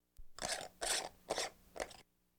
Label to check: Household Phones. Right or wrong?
Right